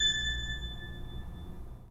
3D Pipe Mix Celeste, 3D Organs

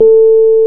PNO, Rhodes Mk.V; Keyboards of The 60's and 70's

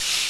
Cheer; Hakunetsu Pro Yakyuu - Ganba League

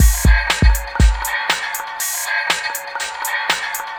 Reverberated Beat; Sonic Foundry (Sony Creative Software); Myths of Technology; Composite Beats

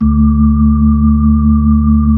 B-3, Keys of the 60s and 70s

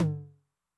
HI TOM, Noise, 909

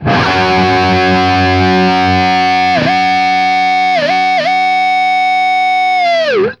POWER F#, guitar power chords; East West; Steve Stevens Guitar Samples Collection

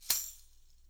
TAMSHA, EFFECT CYMB, 3D Drums; 3D Sound

